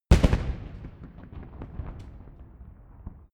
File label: rifle salute fire; comedy-cartoon; pistolen-gewehre